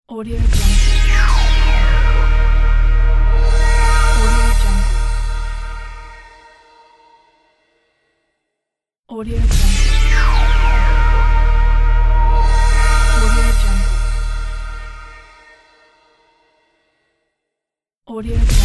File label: bright sharp and penetrating logo; garsumene